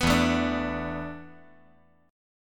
E 7th Suspended 4th E down 5G 4C 5E 5A

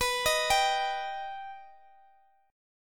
B Augmented, Guitar, Open D

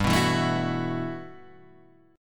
B 7th Suspended 4th G; B7sus4/G; strummed; JGuitar

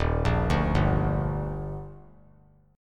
Eb Major 7th; EbM7; arpeggiated; JGuitar

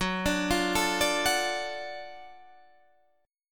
F sharp Minor Major 7th Sharp 5th; F#mM7#5 arpeggiated; 14,17,15,14,15,14; chord shape

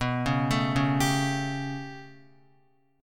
B 7th Suspended 2nd Sharp 5th down Guitar, chord; B7sus2#5 arpeggiated; JGuitar

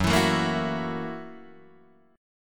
Gb 7th Sharp 9th Gb Guitar Open D

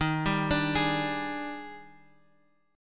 Eb Major 11th, Guitar Half Step Down

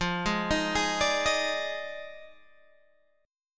F Minor 13th, Guitar Open G

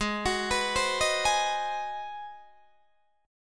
Ab 7th Sharp 9th Guitar Open D, chord

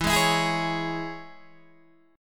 E 6th, down, Guitar, Open D

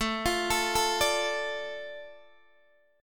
A Suspended 4th Sharp 5th down Guitar Open D

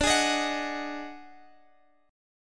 Eb Minor Major 7th Double Flat 5th

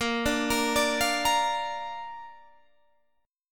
A sharp Major

